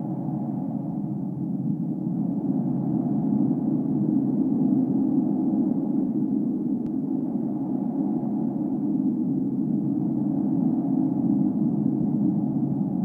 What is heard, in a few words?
corridor, ambiances